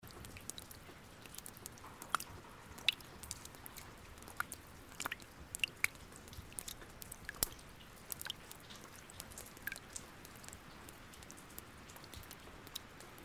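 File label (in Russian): шлепают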